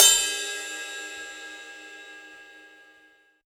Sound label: Ice Bell; Sonic Foundry (Sony Creative Software); Joe Vitale Latin Percussion